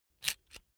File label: electric drill template slide out slow; maschinen; bohrer; soundpool